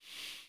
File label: sand dump; vanitas